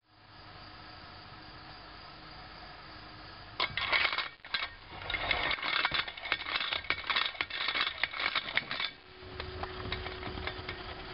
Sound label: spinning top